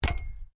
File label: Football hit post, Soccer Ball: Hit The Post, Metal; Sports; Soccer Sound Effects; Soccer (Sound Effects:Sports); Stuart Duffield